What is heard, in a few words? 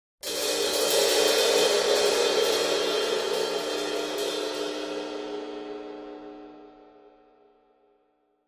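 Cymbal Large Trill, drums cymbal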